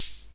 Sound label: Ace Tone Rhythm Ace FR-1, drum machine; drum machines